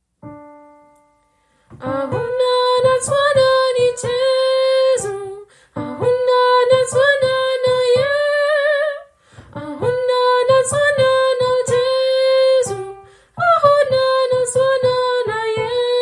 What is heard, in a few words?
tenor